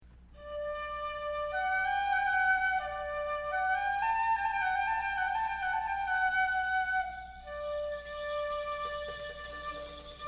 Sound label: Flute